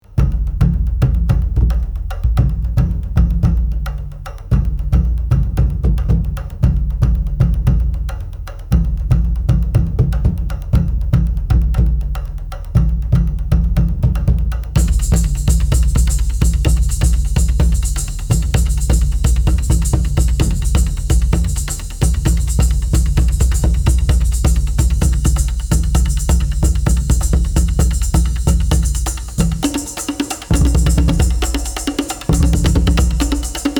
Tribal beat

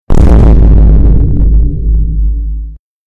Meme funny bass hit; bass boosted

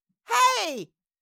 Cartoon little child voice shout scream short

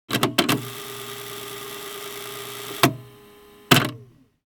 Cassette tape player rewind and stop sequence

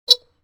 Scooter horn short single honk

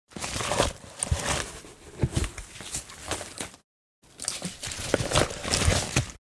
Bulletproof vest put on cloth rustle; Military Equipment

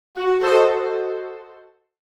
Cartoon accent

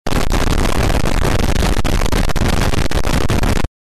Triggered earrape medium; bass boosted

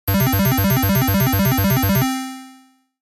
Casino slot machine medium reward prize

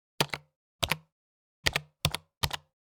Mechanical keyboard typing slow

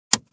Cassette tape recorder push play button

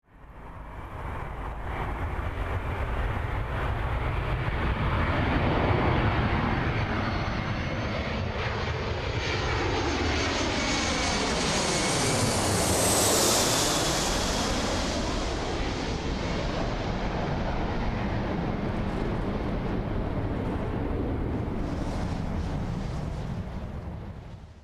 Airplane landing in a nearby runway

